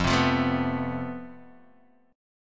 F Major Add Sharp 11th, guitar chord; strummed; Fadd#11; JGuitar

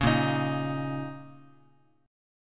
Bb Minor Double Flat 5th Bb, Guitar; Bbmbb5; strummed; 4,6,6,x,x,x; JGuitar

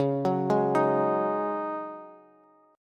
Db Suspended 2nd Suspended 4th, Bass; 21,21,18,20; arpeggiated

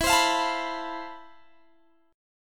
F Major Add Sharp 11th E; strummed

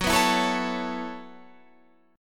B 7th Suspended 2nd Suspended 4th, chord

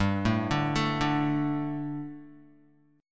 G Suspended 2nd G down Guitar; arpeggiated; 4F,4A,5C,5G,5C,6E

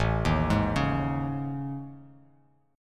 A Major 7th Flat 5th, down; AM7b5 arpeggiated; JGuitar

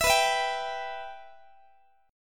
B Suspended 4th Sharp 5th B Guitar; Bsus4#5; strummed; x,x,19,21,20,18

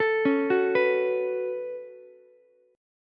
B 7th Suspended 2nd down Ukulele, chord; B7sus2; arpeggiated; JGuitar

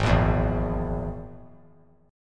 G sharp Suspended 2nd Sharp 5th, chord; G#sus2#5; strummed; JGuitar

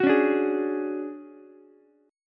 D Major 7th Flat 5th D down Ukulele

